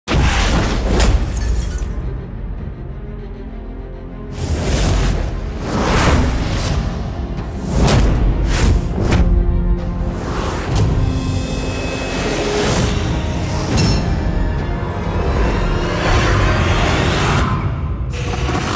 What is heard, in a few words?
Cinematic Trailers Teaser; BOOM Library SFX